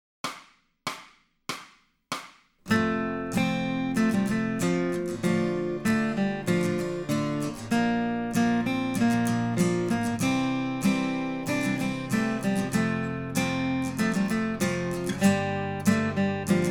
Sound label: Accompaniment, acoustic guitar; Grade 5